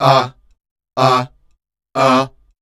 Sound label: WEIRDOS, Voice Spectral